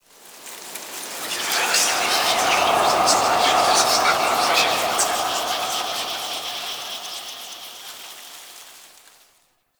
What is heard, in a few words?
Ancestor Tree Wind; Midrath Island; Fey Hub